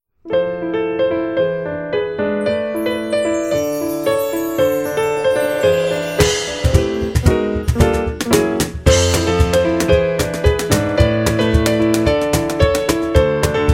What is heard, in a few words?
Pops